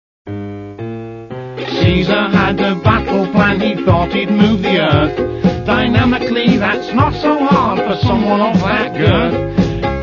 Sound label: little tinkle